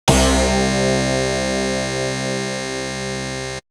Synth; AMG

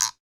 guiro; NorthStar - Global Instruments VOL-2; Guiros

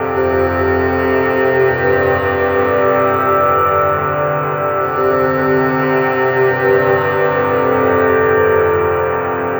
Ambient Melody; Drums & Drones

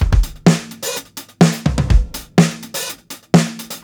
125 16TH W TOM FILLS, DRUMS LOOPS; BASEMENT DRUMS VOL 2

